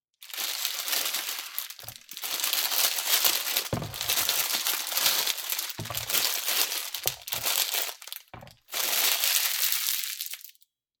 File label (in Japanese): ピーマンの袋を開けて、まな板に置く音